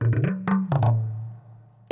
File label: PERC LOOP ETHNO 125; eJay